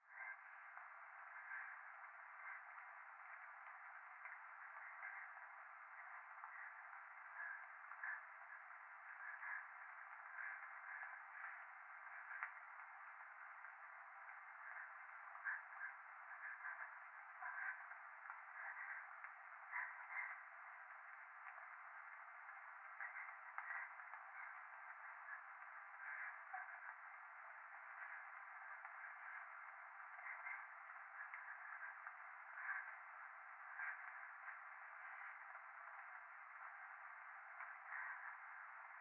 風呂 右耳 快感 吐息 雨

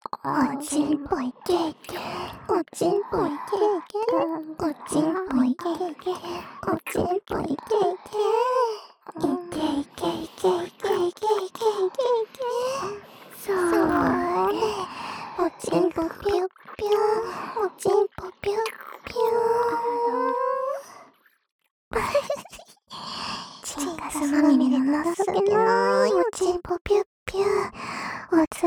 射精しちゃえ～～♪＋ディープキス; おまけループ（オナサポ）; メスガキるびぃに馬鹿にされたい！

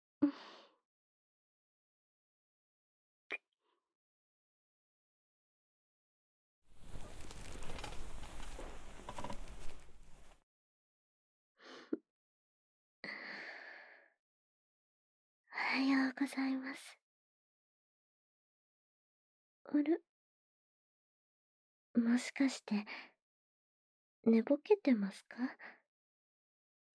モーニングキスは水音, ASMR

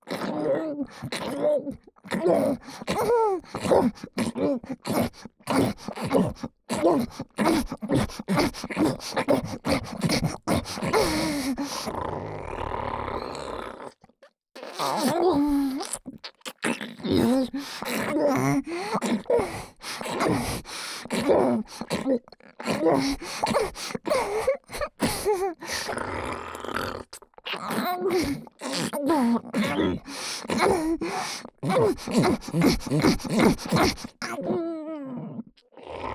喉奥左耳フェラ, ASMR; フェラ素材

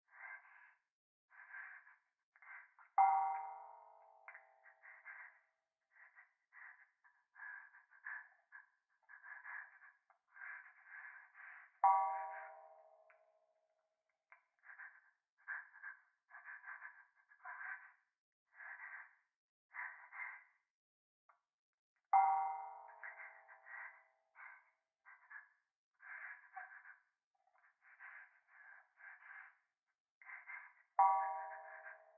風呂 右耳 快感 吐息 寺

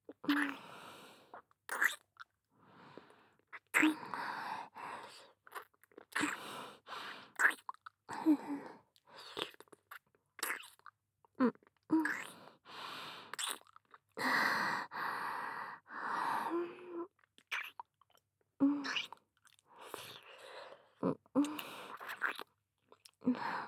キス, ASMR

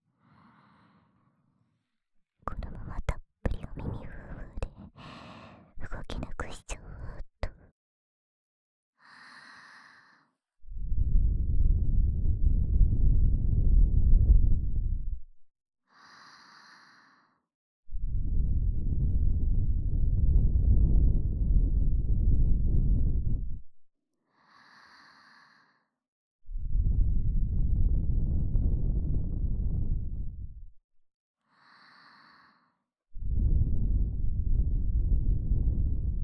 【右】耳ふー, ASMR

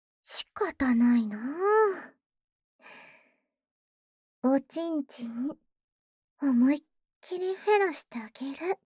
ツンデレ 喉奥フェラ; フェラ素材

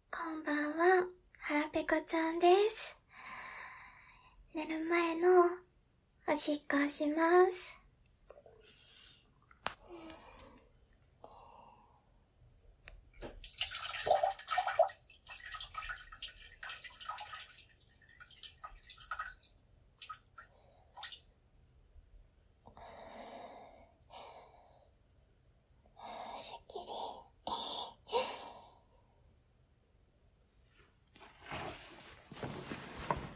就寝直前! 寝る前のおトイレおしっこ! ねむねむでアクビが出ちゃうッ!

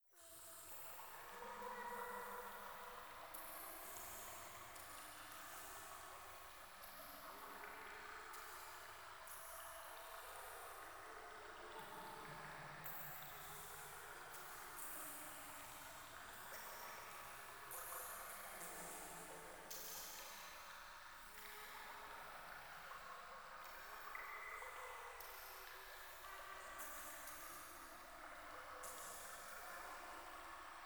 ゴースト イラマチオ 川のせせらぎ

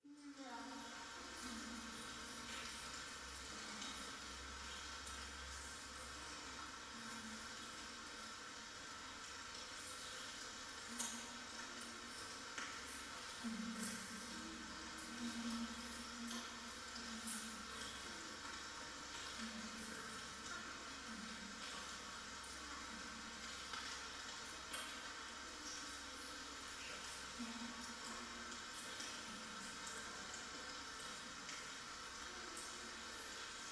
トイレ フェラチオ 雨

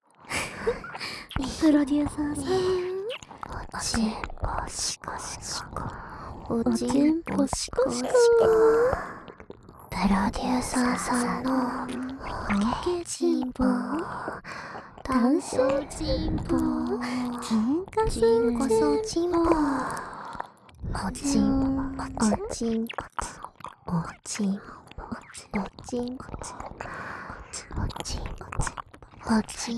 ぐぽぐぽねっとり耳舐め; 元恋人アイドルに馬鹿にされたい！; 由紀＆華恋; おまけループ（オナサポ）

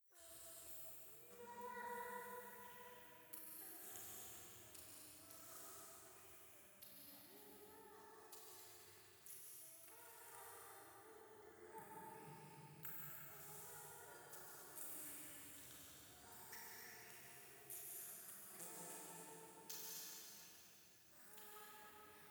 ゴースト イラマチオ

